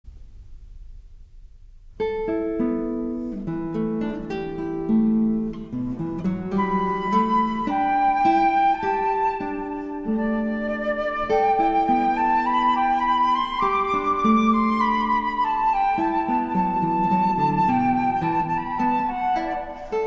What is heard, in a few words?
guitar, flute, chorus; Traditional; Simple Gifts